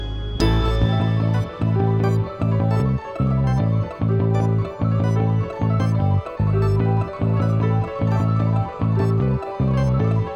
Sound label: Fast Guitars